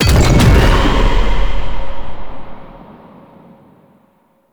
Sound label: Hazard Fracture Activate; Warframe; Duviri; Undercroft Hazards